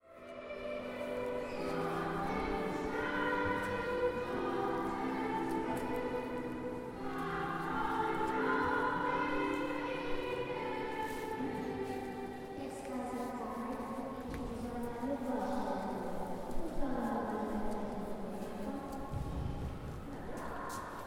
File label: kirche christmas eve childrens service; st marien